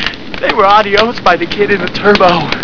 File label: turbo, Supra; CJ's Ultimate Supra